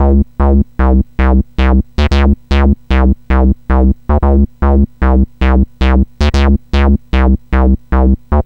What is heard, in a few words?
C64 Bass, Commodore 64, Chiptune